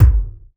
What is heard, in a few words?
short reverb bass drum one shot b key